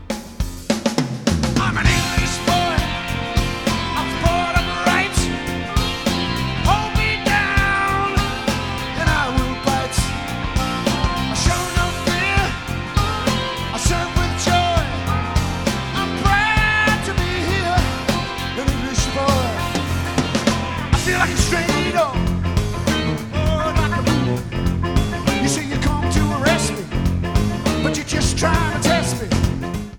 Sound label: Original Vocal Version